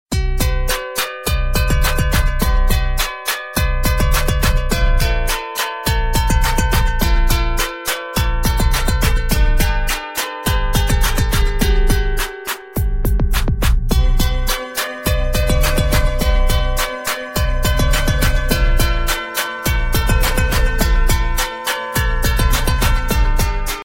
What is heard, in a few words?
nice guitar ringtone